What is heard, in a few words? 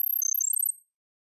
Hightech Confirm